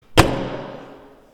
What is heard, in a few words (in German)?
tuer zuschlagen